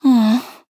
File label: Nonverbal Empathetic; Aurora